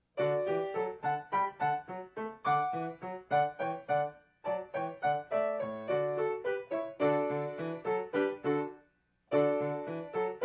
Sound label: Hallelujah Chorus, piano